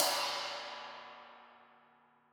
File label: Trash E, drum